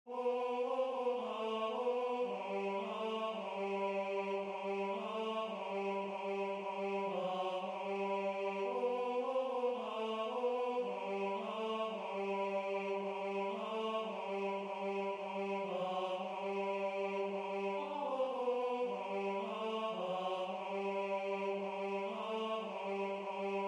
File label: Tenor